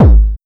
909 Peak, Kick Drums; Zero-G; Soundclash

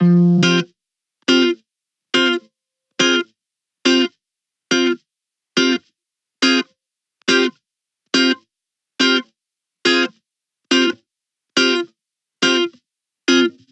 140BPM Fmin Guitar DRY, Offbeat Dub Guitars; Antidote Advent

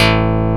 DIGITAL BASS, BassGuitar

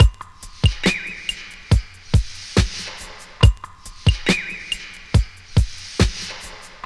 LOOP, Drum Loops; Big Fish Audio; Prosonus 500 Drum Loops

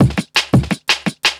Break, Drum N Bass Percussion

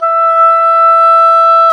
oboe, Oboe Brth

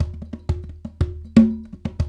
World Dance

